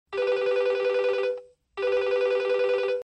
old desk phone ringtone

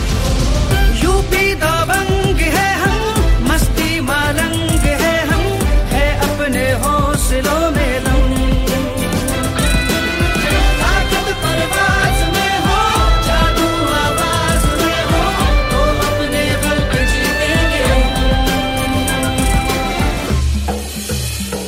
indian pro music ringtone

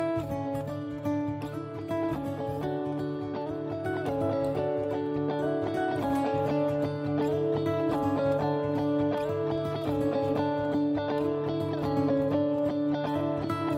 hindi ringtone, bollywood; sooryavanshi

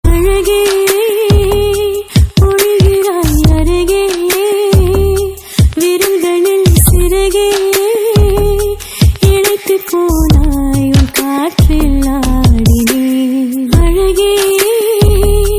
female version, ringtone

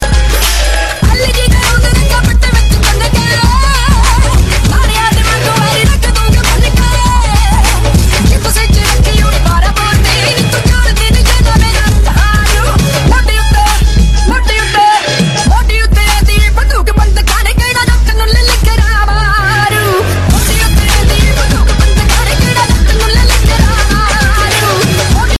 ringtone

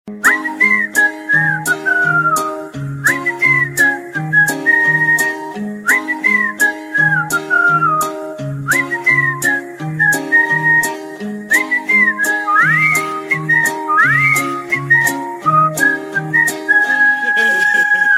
pa pa pa whistle ringtone, funny

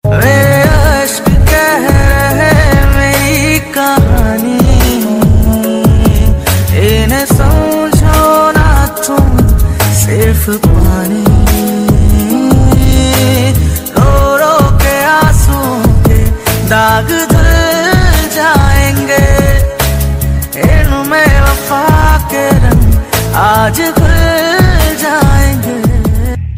romantic ring ringtone, bollywood; bollywood ringtones

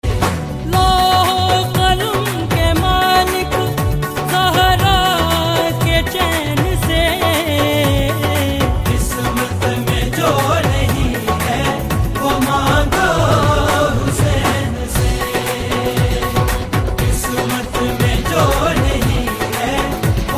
islamic ringtone

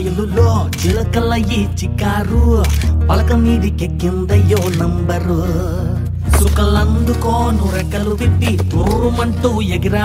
song ringtone

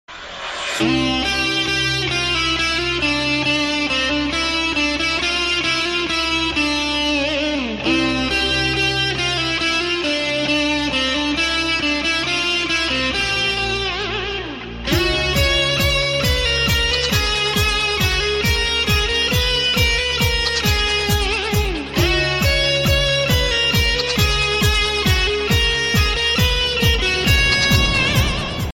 cute sms ringtone

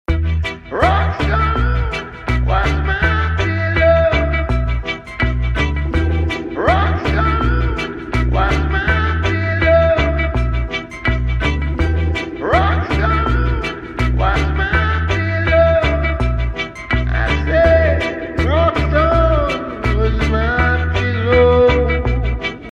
reggae ringtone; english ringtones

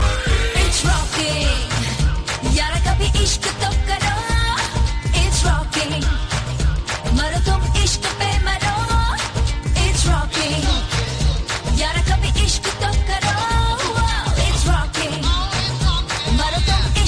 rocking hindi song tone ringtone

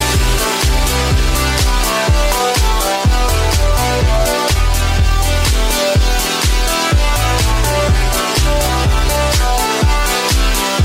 huawei nova 3i ringtone

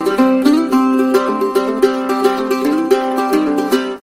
bluegrass mandolin ringtone

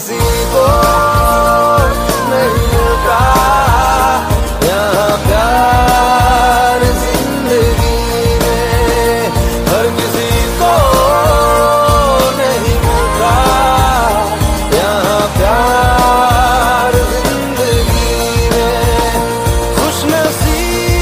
bollywood song ringtone

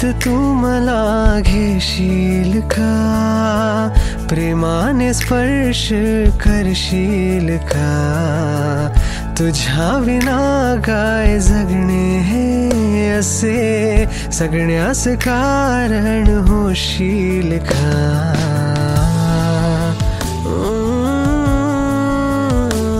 marathi ringtone

